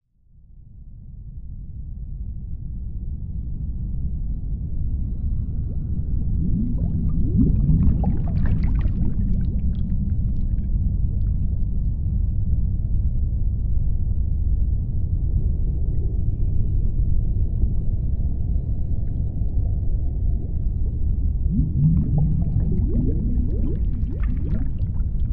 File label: whales singing, WHALES SOUNDS underwater